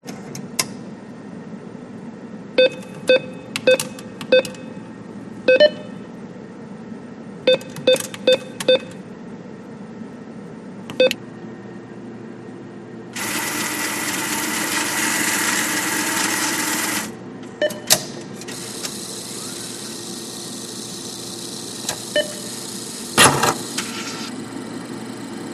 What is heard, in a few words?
atm withdraw money; the sound pack tree